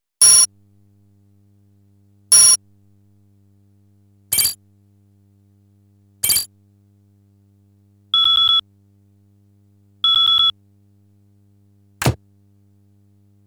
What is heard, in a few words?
Electronics Sounds (SFX); Ghost in the Shell; Stand Alone Complex Music Archive Sound Files